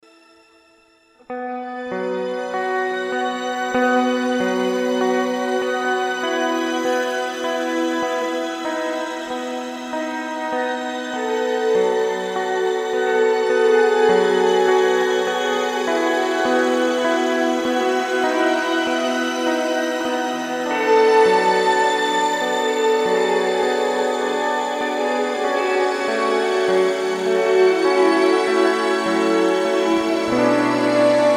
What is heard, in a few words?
Immerse MKII Shimmer